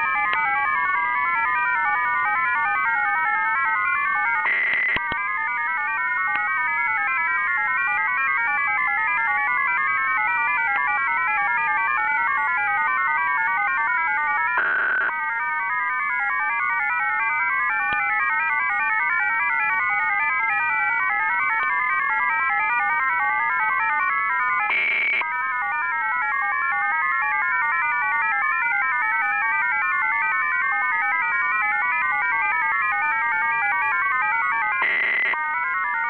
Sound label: digital mode